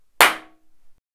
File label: studio clap single; Sonex